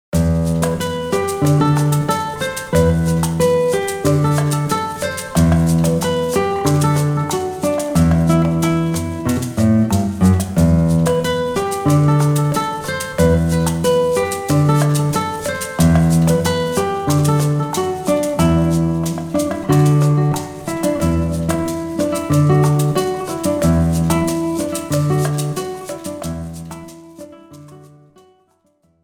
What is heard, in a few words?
Cumbia, guitar